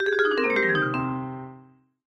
Duel Lose

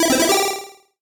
Music Effect Solo Chip C64 Positive; Stereo

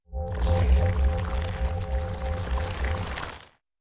Eyeboss Spin; Super Mombo Quest

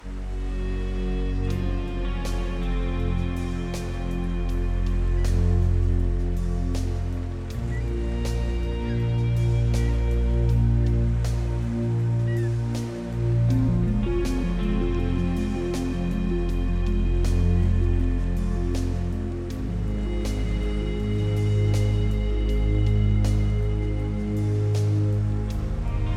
Beach; CSI: Miami

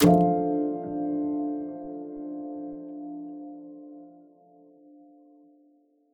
UI Menu Press Return To Menu; Blue Sheep

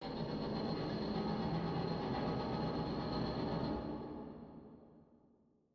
Clock Elevators Crossing; City SFX

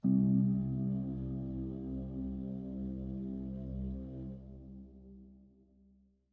Harmony Note C1; Cave Music

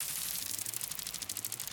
Spider

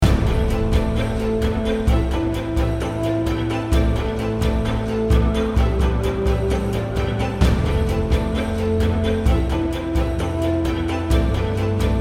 Epic Drama

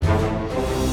Music Fight Pirates Theme Hard Bpm128 Start; asterix obelix slap them all 2

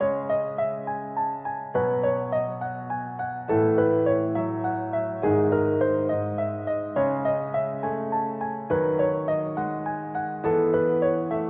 music battle; Phantom Rose

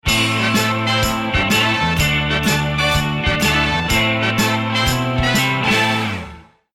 End Event (Victory); The Onion Knights

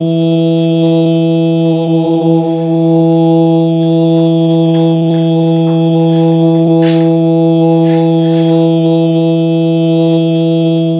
Hum High; Zuma Hum High

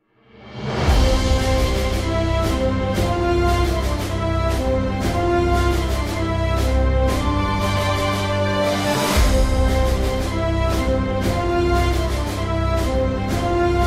[Orchestral Fanfare] Victory!; Niko and the Cubic Curse; Xbox Series X/S

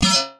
Ui Navigation; Cruelty Squad

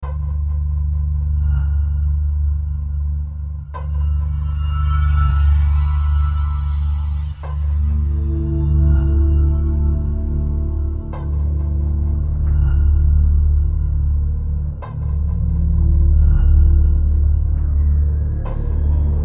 Ambush Ambient; Infernal; xbox 360

